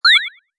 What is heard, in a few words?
warp in